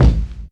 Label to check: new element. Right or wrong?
Wrong